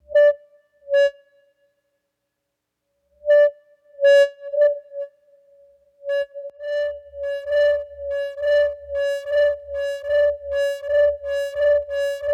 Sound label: Beep Boop Two, Feedback